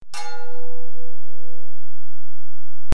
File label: Metal Clang